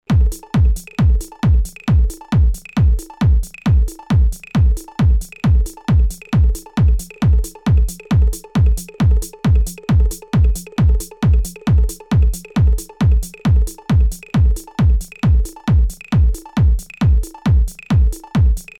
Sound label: Pan